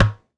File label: Tennis Racket Ball Hit Med